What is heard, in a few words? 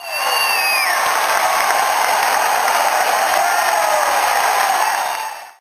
cheer; roflnauts